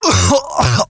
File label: cough; player